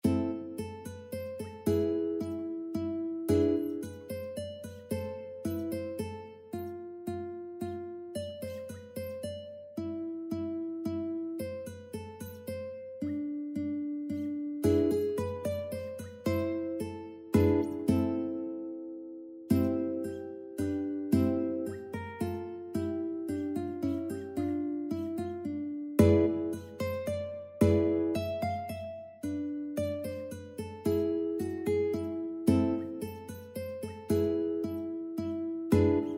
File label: uke minuet; leopold